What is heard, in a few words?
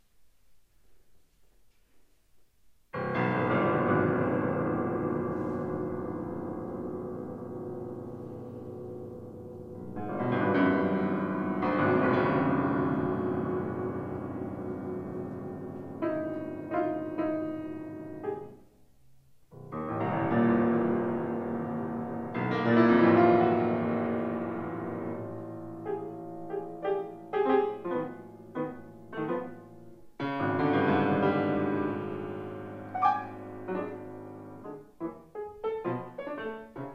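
piano solo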